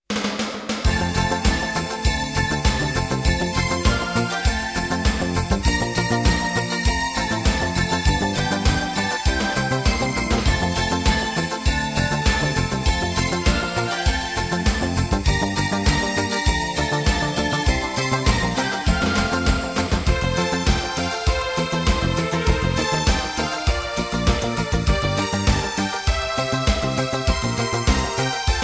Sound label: Jingle Bells; Focus Music